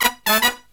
Killer Brass Riffs, SOUL; USB Soundscan vol.29 - Killer Brass Riffs; AKAI

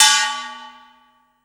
percussion special; Ueberschall; Techno Trance Essentials; DRUMS

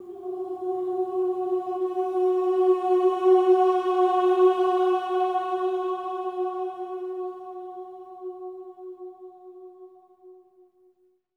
OH F#4, Symphony of Voices, SWELL OHS; Spectrasonics

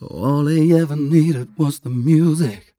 m 129 mel e, Vocals

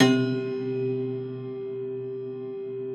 Rubbery Piano, prepared piano

